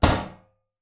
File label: impact metal lid large bang dull